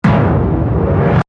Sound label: Hit Phase Deep